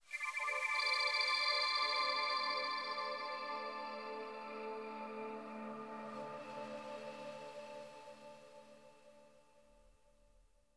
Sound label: Stardust Magic Wind